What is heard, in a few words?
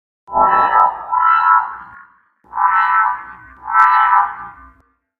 Robot Idle Scan; creatures mechanical; Lego